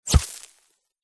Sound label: warfare bullet hit ground dirt; zapsplat